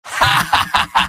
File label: laugh evil; medic; video games; source engine; tf; mvm